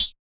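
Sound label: electro closed hi-hat; al-jazari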